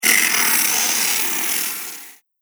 Static; Ghost Sound Effects; Horror Sound Effect

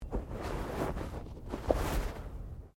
Body movement Clothing Foley

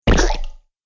Muddy Puddle Splat

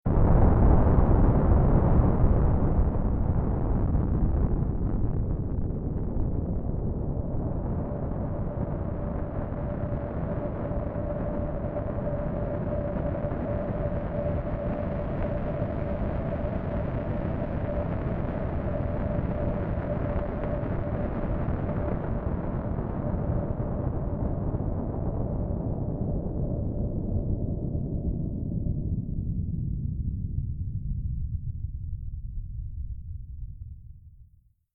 Nuclear Explosion Blast Long Rumble Distortion; Warfare Scifi Science Fiction; Explosions

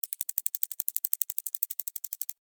Insect Walk Move, Insect, Walk, Movement, Bug; Blastwave; Animals:Insects

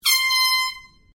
Airhorn Small Blast Long, Compressed air horn; Sports Sound Effects; Sports (Sound Effects:Backgrounds)

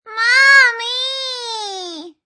Girl, Young girl whinning; Young girl whinning "mommy!"; Whining; Voice

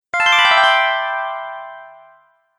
Achieved, beep

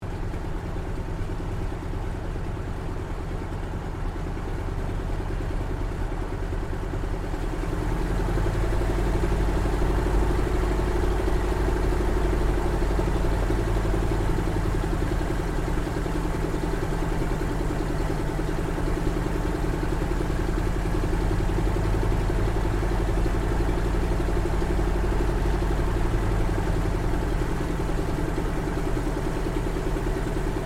AIRCRAFT CAC WIRRAWAY PREFLIGHT INTERIOR STEREO; CA-16 Wirraway; Airplanes

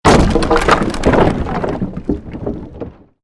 created wooden structure crash medium

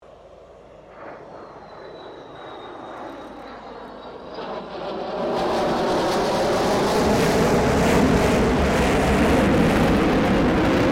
AIRCRAFT FA-18 HORNET PASS; RAAF FA-18 Hornet; Airplanes; Sound Effects:Vehicles

